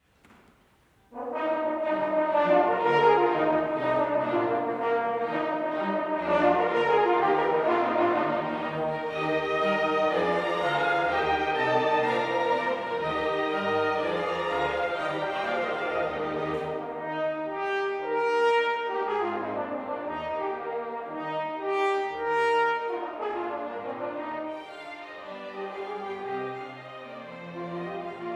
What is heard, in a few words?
Mozart Horn Concerto Finale Extract